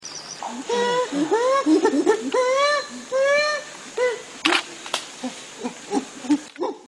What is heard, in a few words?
singing apes